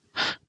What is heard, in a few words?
hurt Sound; hexhollow